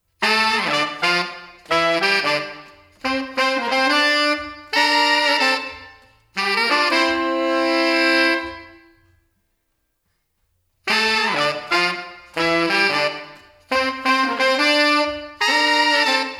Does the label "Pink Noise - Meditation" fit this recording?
No